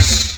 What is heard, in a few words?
Korg, FX